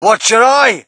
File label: crowd, shout; Mike Tyson Heavyweight Boxing